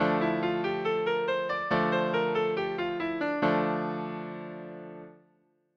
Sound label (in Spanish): Re menor, escalas